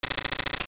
Stalfos Knight Crumble; Stalfos Knight crumbling (a modification of "Door Unlock", repeated quickly several times; LTTP

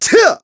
Attack; Ghirahim; zelda sounds; Skyward Sword

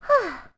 Sigh; Romani sighing

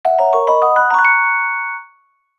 Fanfare Collectible; "Get a Collectible" fanfare (common treasures, insects, etc.) (Recorded); Skyward Sword; Zelda

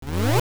Pause Menu Open; Open and close the Pause menu

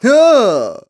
Yahh; "Yahh!" (Ghirahim displaying wall carvings); Skyward Sword

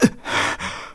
Breathe; Link

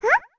Baby Goron Huh

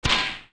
Deku Nut Snap; A Deku Nut snapping; zelda; OOT

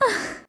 Concerned Sigh; Zelda making a concerned sigh; Skyward Sword

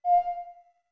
Ocarina F short; OOT

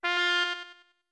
Notes Pipes F med; Deku Pipes (medium)

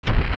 Run; Moblin

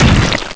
Lava Block Land; A lava block landing

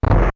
Door Close; Closing a door (also Armos Knight stomping); Zelda

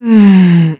Sleepy; Link turned sleepy

